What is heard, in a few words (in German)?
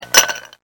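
eiswürfel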